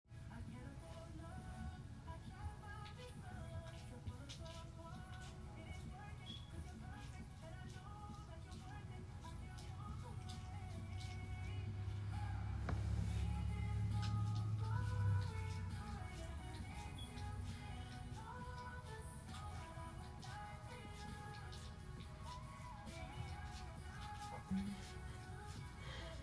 sleepy girl bedtime routine, Whimpering, Wet noises; F4M